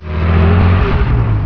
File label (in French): accelere, tank; svencoop